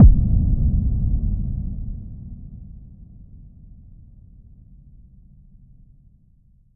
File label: Fx Impact Nuke; bass house